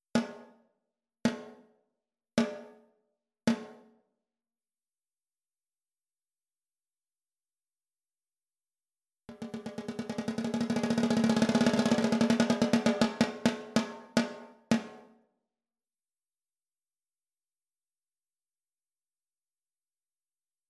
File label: DDRUM